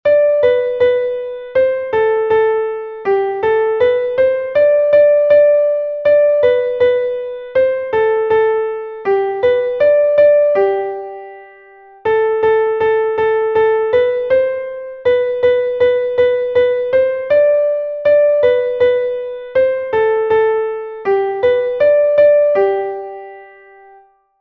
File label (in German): klavier; haenschen klein; melodiemeister